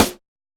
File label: Snare, Dubstep Snares